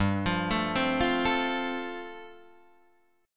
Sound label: G 9th Suspended 4th, Guitar Drop D